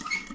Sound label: turn off the faucet; anemoi